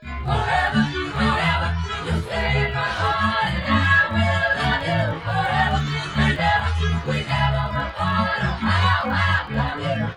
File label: chorus 1; sound source separation